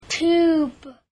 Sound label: tube